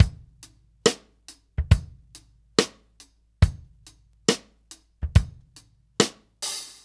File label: Ballad Loop, Drum, 70s Ballad; Laidback; Ballad Loops; Drum Tools